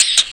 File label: RSS, 160 BPM